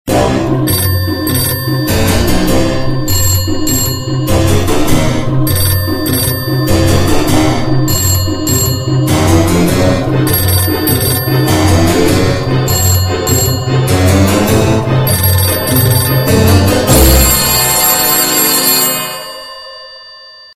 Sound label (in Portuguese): musica de terror